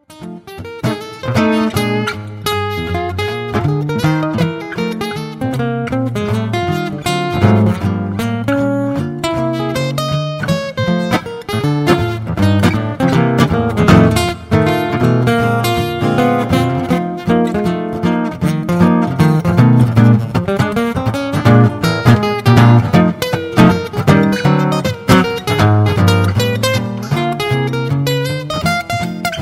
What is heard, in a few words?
Blues in F